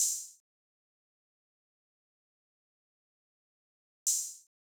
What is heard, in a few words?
Drum Loop 118 BPM Open Hat; Drum Loops; Loop Stems; Cymatics; Nueva Drum Loop